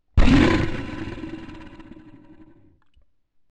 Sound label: rawr